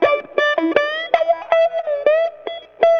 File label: Wah Wah Gtr